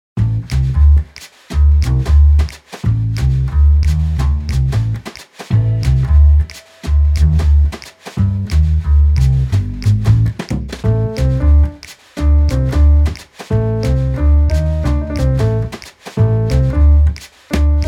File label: jazz background music